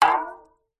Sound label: impact metal light hit cooking tin water inside wobble pitch